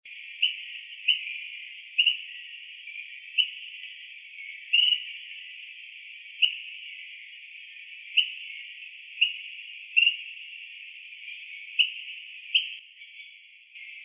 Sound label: Eared Pygmy Tyrant (Myiornis auricularis); EcoRegistros